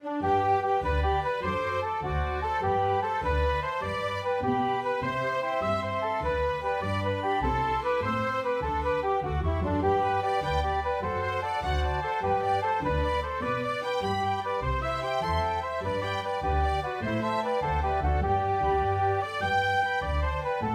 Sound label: 48 bar Jigs; Waves of Tory